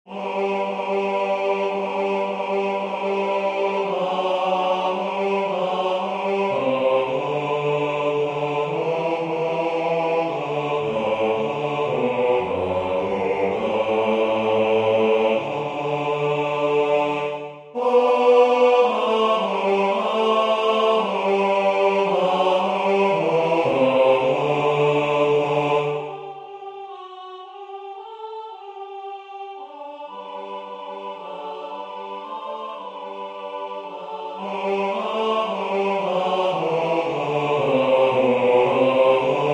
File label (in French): basse; Adeste fideles; Willcocks